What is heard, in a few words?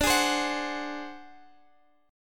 D 7th Flat 5th D down Guitar Open G